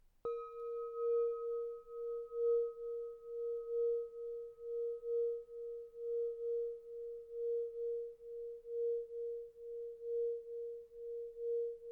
Cloche tubulaire 25mm A4

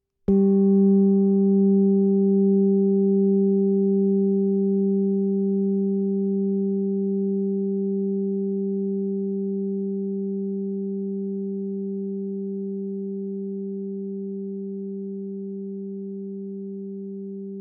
plaques harmoniques G3

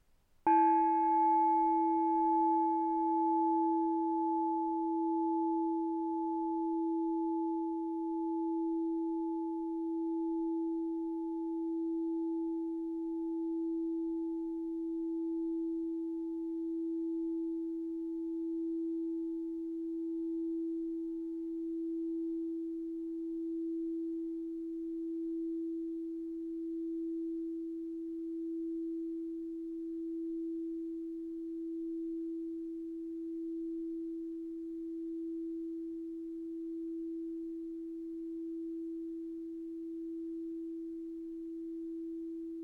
cloche tub 50 E

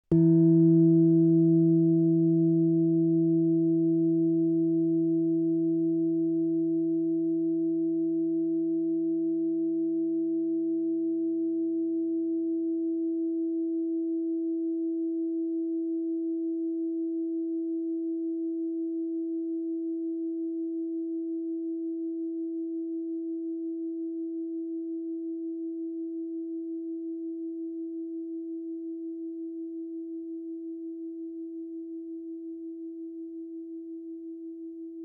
plaques harmoniques E3

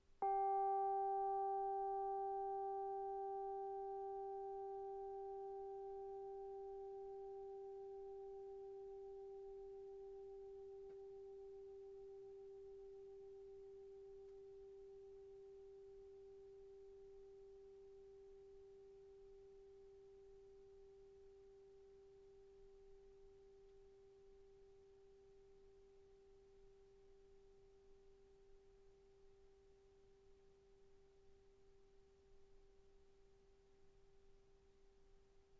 Cloche plate G4

